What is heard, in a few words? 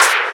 raw hand clap sound a sharp key; single hits one shots